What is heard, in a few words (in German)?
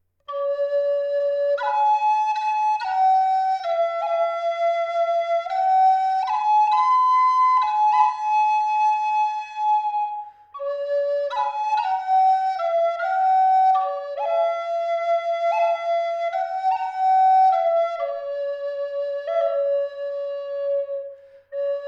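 Eastern Red Cedar 6 Loch D hoch 432 Hz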